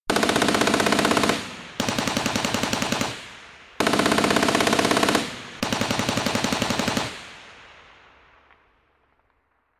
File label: General purpose machine gun pimple & turret mounted firing in turn; Guns; BBC